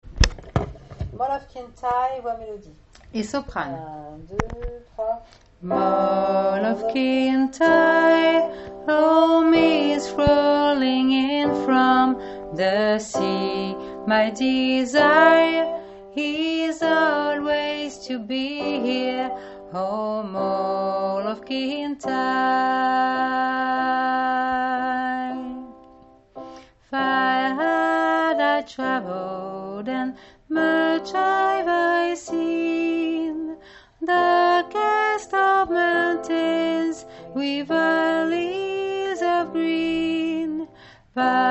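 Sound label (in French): voix soprano et fond melodie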